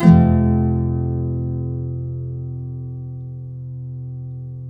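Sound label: nylon up major F#; FLAM CHORDS; Guitars; Spectrasonics; Hans Zimmer Guitars VOL-2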